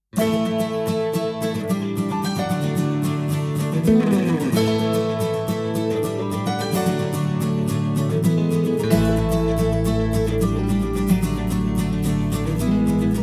without drums

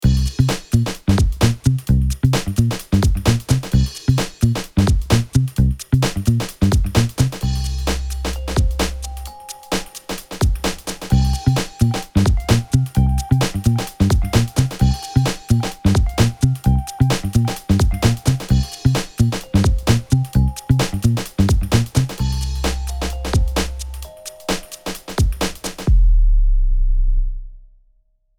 Funky Bed

